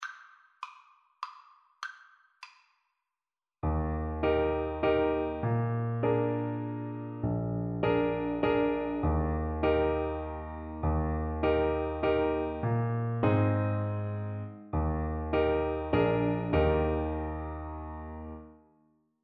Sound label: Baritone Saxophone